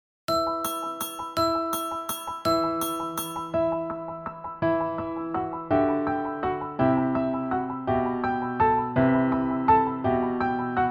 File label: Ukrainian Bell Carol, multi level piano ensemble